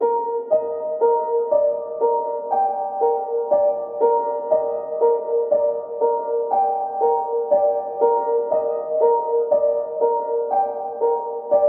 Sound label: Grave High Piano 60bpm C min; Ambient, Keys, Reverb, Soft; Loop; Melody